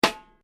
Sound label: snaredrum fortissimo